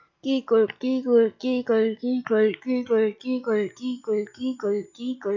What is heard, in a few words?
giggle